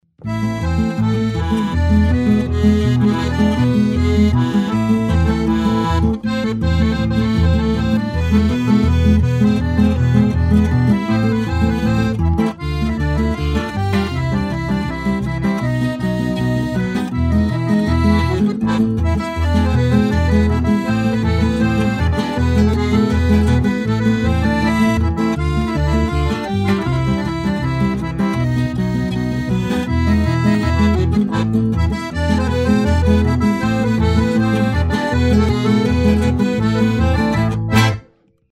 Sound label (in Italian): mazurka turca